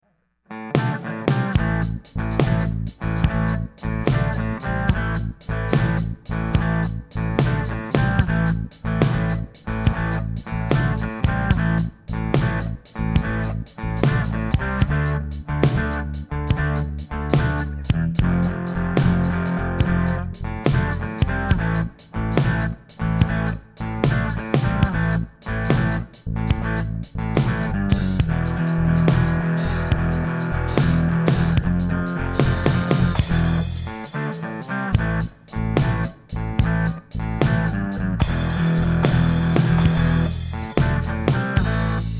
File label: Blues idea; 9th Street